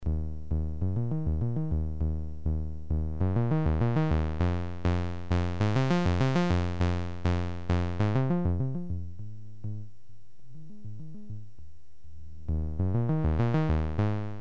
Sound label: tube vcf